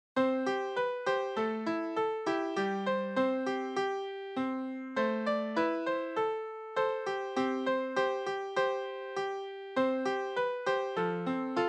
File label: Piano